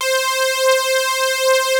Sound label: POLY SYNTH; Wizoo - Powered Wave